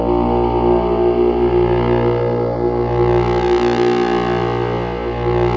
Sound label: SYN Wavomatic; Best Service Gigapack 1